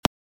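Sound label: kick attack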